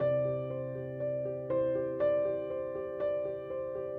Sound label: intro free spin loop; PIXIGSLOT; netent; narcos